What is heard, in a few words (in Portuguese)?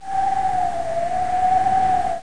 vento; GEOGRAFIA